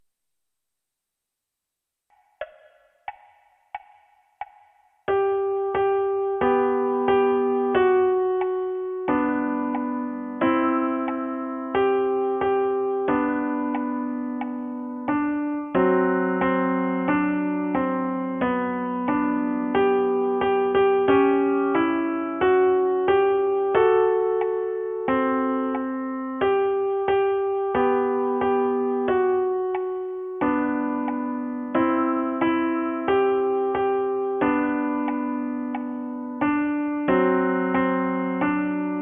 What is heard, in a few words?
piano unite